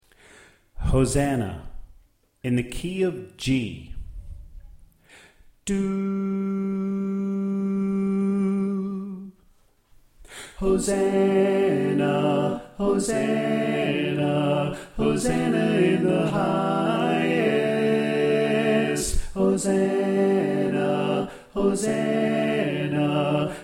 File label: Tenor Left; Hosanna